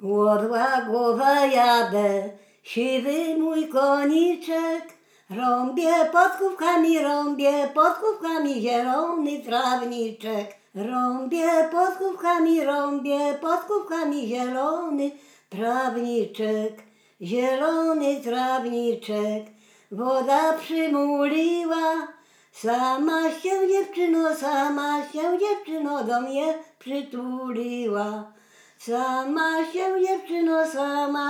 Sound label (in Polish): poleczka; od Łagowa jadę